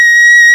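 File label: SOPRANO HI, CHORAL; FAIRLIGHT CMI IIX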